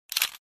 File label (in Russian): odinochnyj zvuk fotoapparata